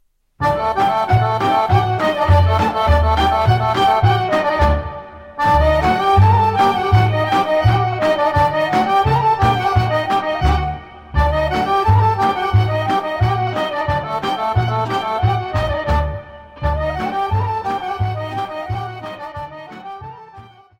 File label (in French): Vlachko Horo - Bulgarie